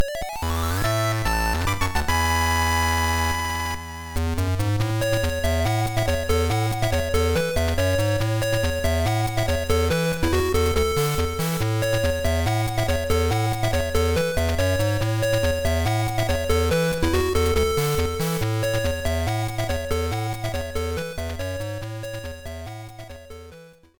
Level Up Fanfare; Ganbare Goemon Mononoke Douchuu Tobidase Nabe Bugyou; GBC; Getting Stronger!

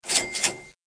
Cash; Learn to Fly 3

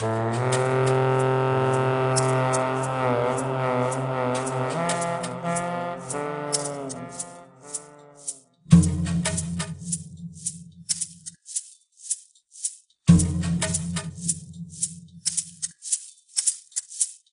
Jungle Level

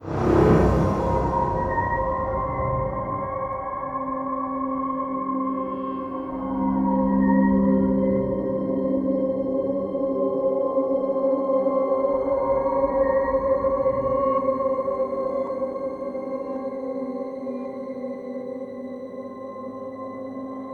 Stinger; Datacore Merit Beat; Syndicate

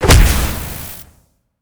Damage; helltaker

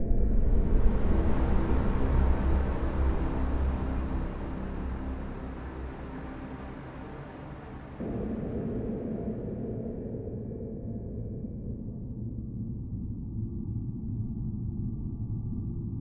Danger Tonal; Insanely Twisted Shadow Planet

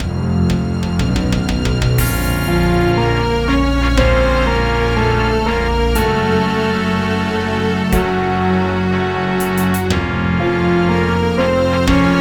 Lose; Warcraft: Orcs and Humans

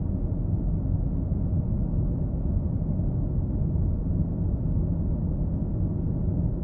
Ambient Cockpit; Among Us

